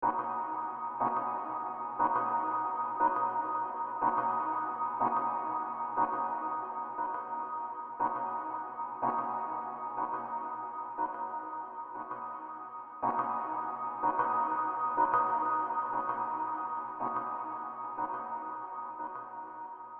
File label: menu loading